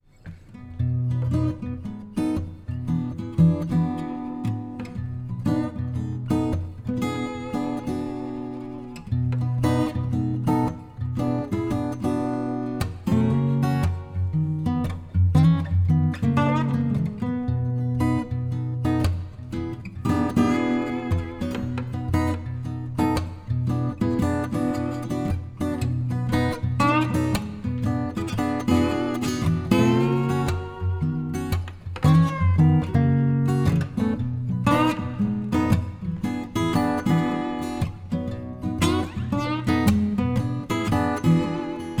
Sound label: Front Porch Blues